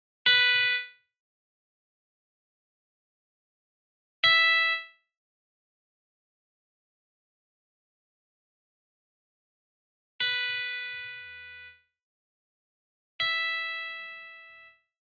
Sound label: electric clean open strings 2 and 1